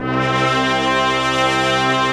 BIG BRASS; OMI - Universe of Sounds

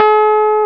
KEY MKS20 E, MKS20 + EP

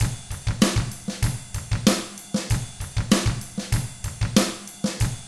HARD ROCK, Drums; ILIO; Double Platinum Drums 1

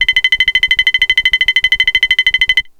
HELMET SOUND, Science Fiction; Mower Man

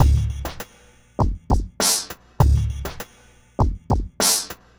Remixed Drums 100BPM; The Roots Drumloops

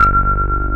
WIDGET BASS; Pro-Rec - Synthsonic; BASSES

